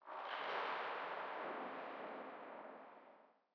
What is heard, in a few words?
cave, ambient; HunterZ G4; minecraft